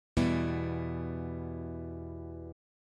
E minor, guitar

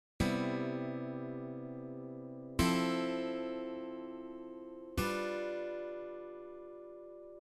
Cmaj7 Fmaj7 G7, guitar

